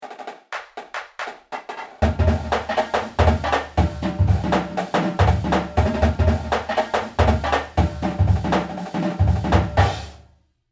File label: swing drill